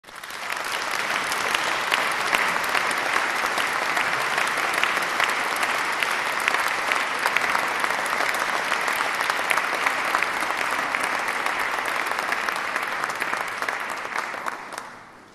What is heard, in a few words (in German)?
Applaus; Chor und Jubilaeumschor Canco de nadal Traditional